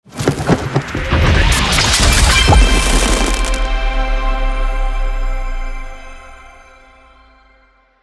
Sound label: animated orb